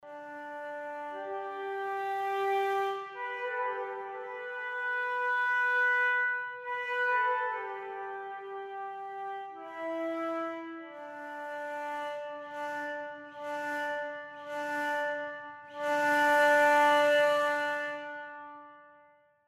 Woodwind Solo Flute Legato; Artinoise Recorder DAW

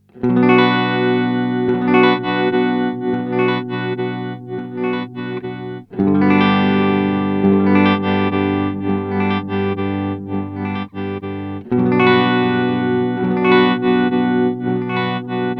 Chase Bliss Blooper

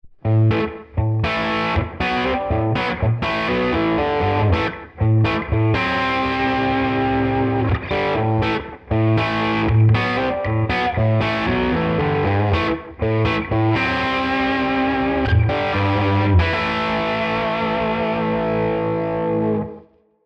Tone King Imperial MKII Vintage

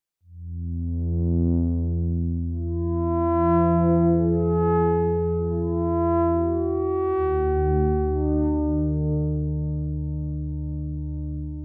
Pad

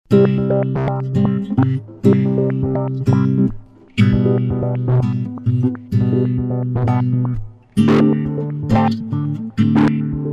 Resonances; EFX Motion Escher

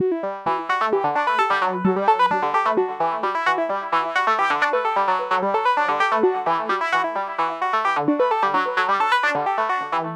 sum FX Original Synth

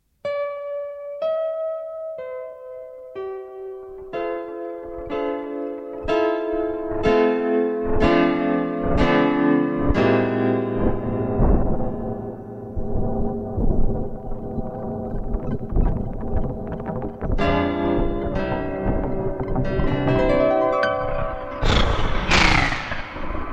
Yamaha SY77; 1989

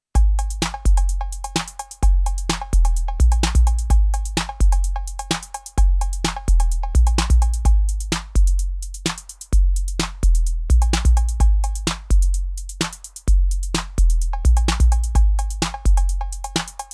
Roland TR 8S Chromatic808 Clean

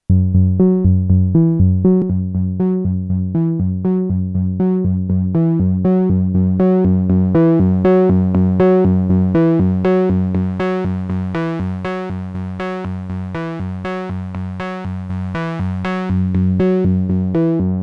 Earthquaker Devices Zoar Synth

